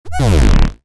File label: frequency modulation squash fat; sound design